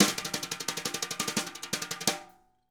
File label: SNR BR ROLL